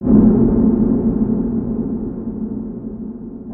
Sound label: Sphere Sound; 140BPM